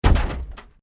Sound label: CRASH VEHICLE SMALL, vehicle accident sounds; A series of vehicle accident sounds created using FMOD; Crashes (Sound Effects:Objects)